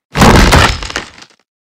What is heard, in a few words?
crate stash; ROOTBOX